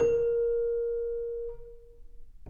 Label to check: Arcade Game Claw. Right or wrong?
Wrong